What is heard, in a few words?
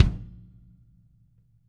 kick, Interactive Drum Kits